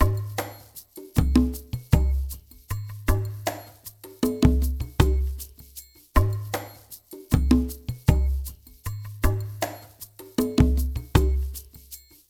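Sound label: ICE FULL PERC, Liquid Grooves; Spectrasonics; Liquid Grooves 1 & 2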